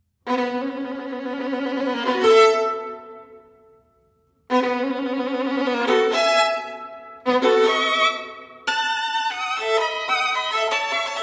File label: Sonatas for Violin Solo, Sonata No. 6 in E Major; Ysaye; Op. 27